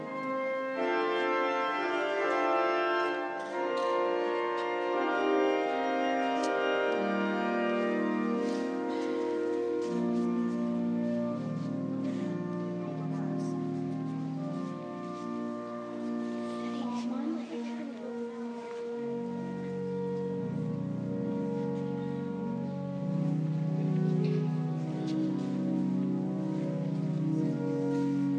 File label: pipe organ; st davids